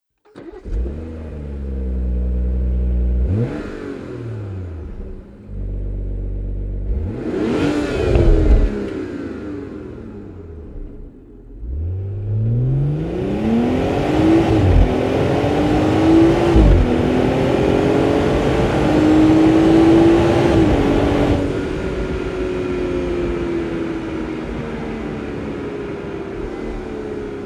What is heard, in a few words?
5er Stock Exhaust System